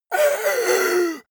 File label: monster inhale; kreaturen